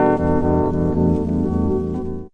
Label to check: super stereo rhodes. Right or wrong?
Right